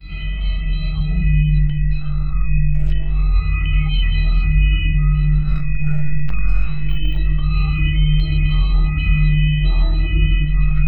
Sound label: system console spaceship